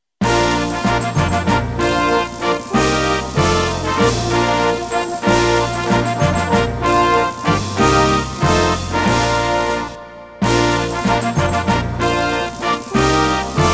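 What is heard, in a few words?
fanfare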